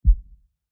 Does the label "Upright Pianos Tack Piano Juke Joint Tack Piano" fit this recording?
No